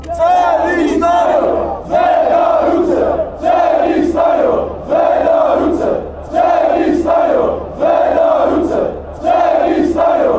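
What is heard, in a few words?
zvednete ruce, football chant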